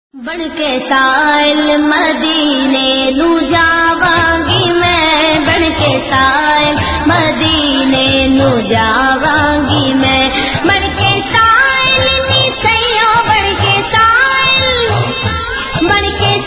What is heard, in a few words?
naat ringtone